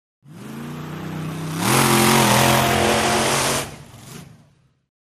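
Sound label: Monster Trucks Revving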